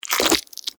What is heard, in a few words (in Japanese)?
クリーチャーが食べる音